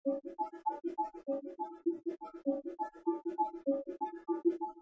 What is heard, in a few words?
Bongo